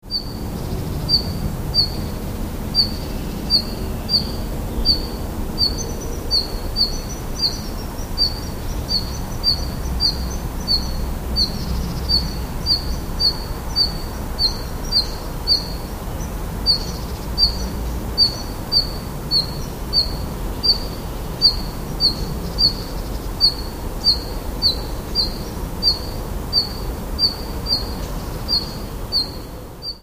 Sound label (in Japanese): ruribitaki